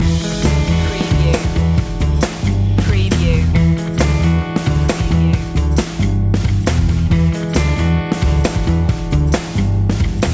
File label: dorm room loop